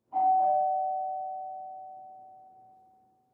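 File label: Doorbell Single Ring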